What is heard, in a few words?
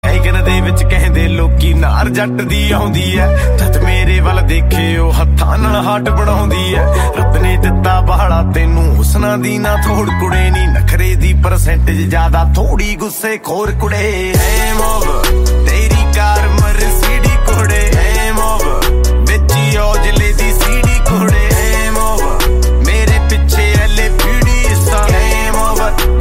game over ringtone